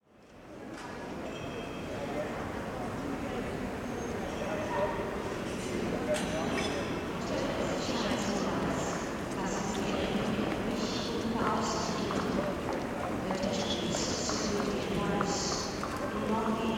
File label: Frankfurt Airport Germany